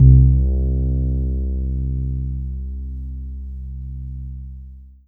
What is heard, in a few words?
Casio VZ 10M Fretless Bass C2